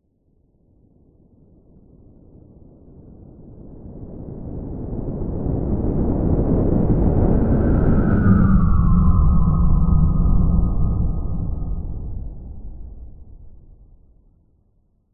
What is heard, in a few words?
science fiction spacecraft mothership large sized electric fly overhead whistle; hum; zapsplat